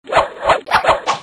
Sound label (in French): attaque